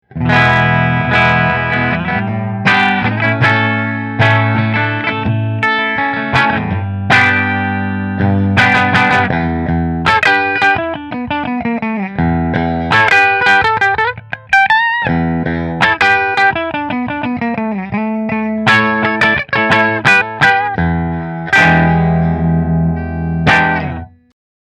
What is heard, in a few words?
Hamer cherry Monaco Bridge Fender